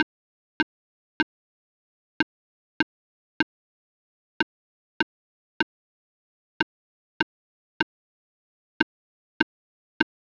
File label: Clarinet vs Clarinet